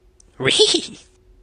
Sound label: reeheehee